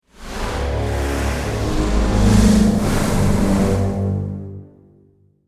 Dark Production Whoosh, Metallic, Intense, 3 Notes.; Dark Production Elements; Stinger Sound Effects; Dark Production Whoosh 13: Metallic, Intense, 3 Notes.